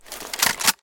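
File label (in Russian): быстрая перезарядка пистолета профессионалом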